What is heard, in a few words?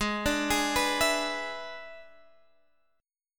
E 7th Ab, guitar